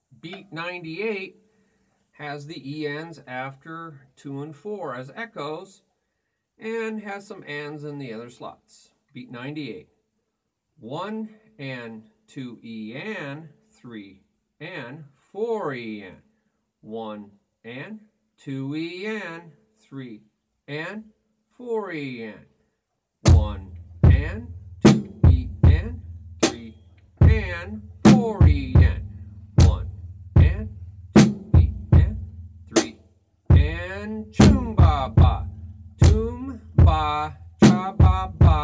Beat 98 1 e 2e 3 4e Toom Ba Cha Ba Ba Ti Ba Choom Ba Ba